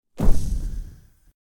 Falling Sack; fa battlemaps

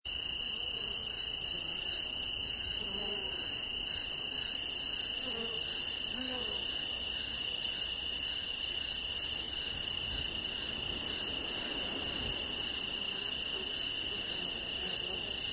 Forest Outskirts Night; fa battlemaps

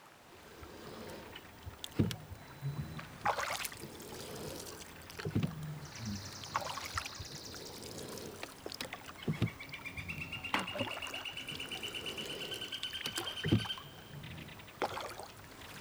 rowboat, ambience

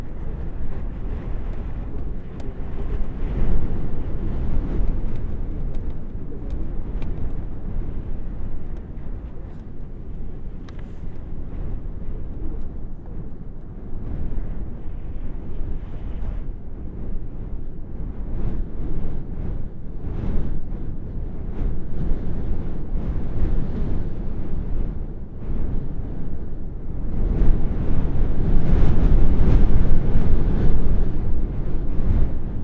wind; calendaria

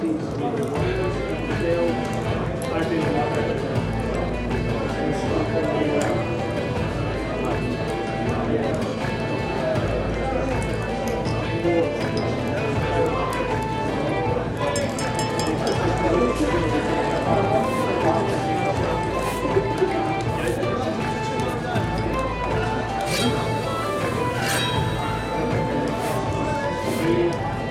keg, ambience; caydens; pf2e; hellbreakers

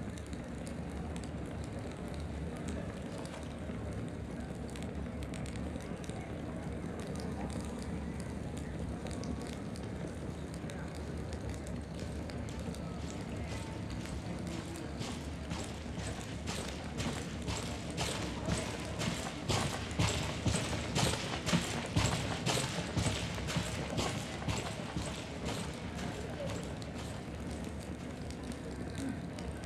imperial palace, ambience; pf2e; hells destiny

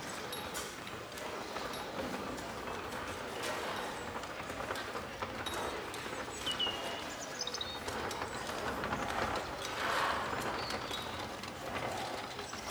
town, ambience; pf2e pfs07 year of battles spark

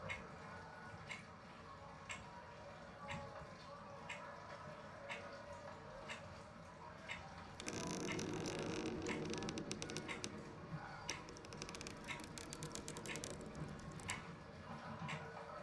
indoors, ambience

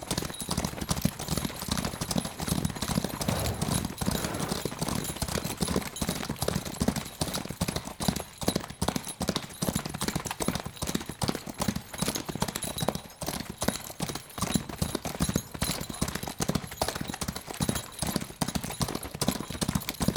ride or die, ambience; pf2e; hellbreakers

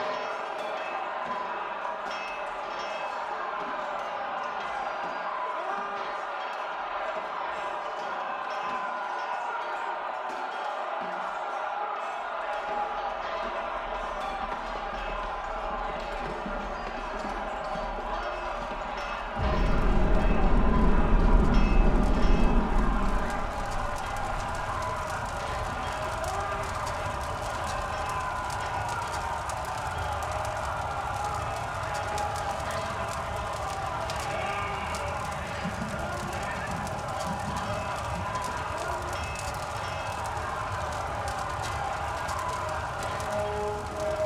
battle, ambience; saringallow; pf2e; hellbreakers

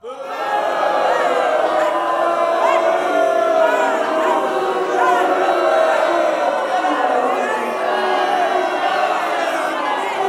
crowd boo

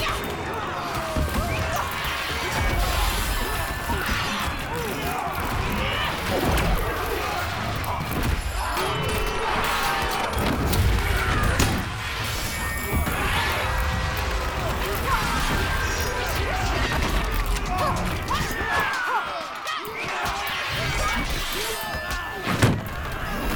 skirmish, ambience; pf2e; hellbreakers

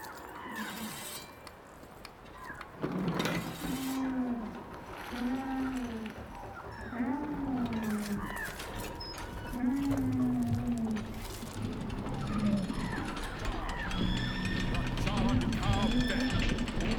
dig site, ambience; jistkan

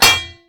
Bear Trap; fa battlemaps

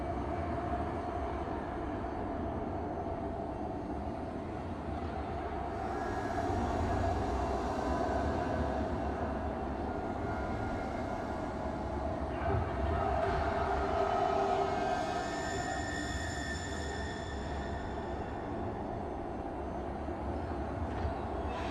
graveyard, ambience

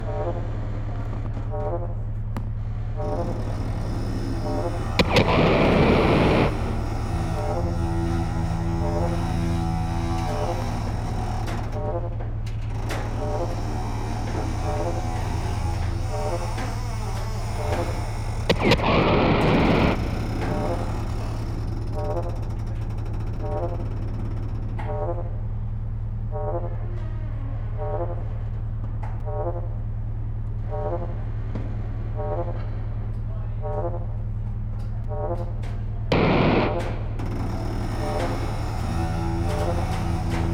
nova rush under attack, ambience; sf2e; guilt of the grave world

